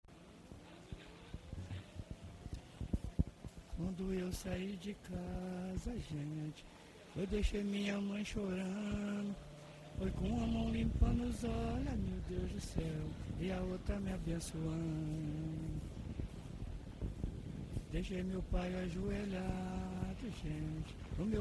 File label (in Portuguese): caxambu